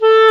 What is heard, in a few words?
WND CLAR F, Clarinet; Roland L-CDX-03 Disk 1; Clarinets